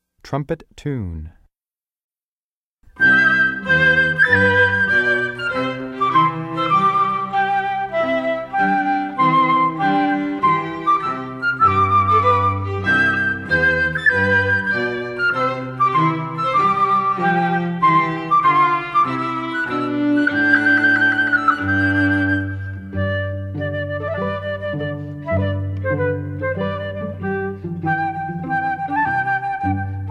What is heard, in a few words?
trumpet tune